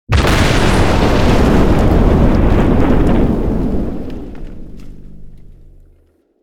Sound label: Huge Explosion